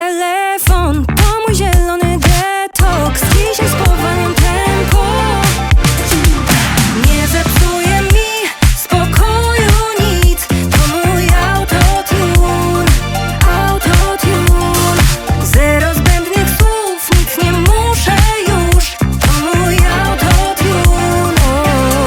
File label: Autotune